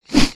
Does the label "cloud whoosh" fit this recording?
Yes